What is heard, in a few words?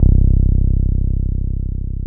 TB 303